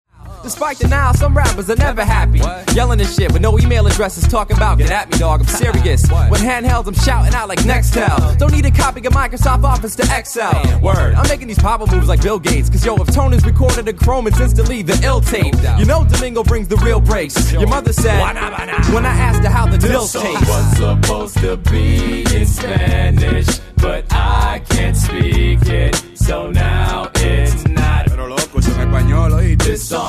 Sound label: spanish song